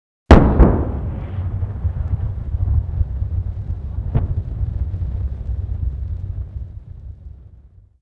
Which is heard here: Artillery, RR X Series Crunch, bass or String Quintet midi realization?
Artillery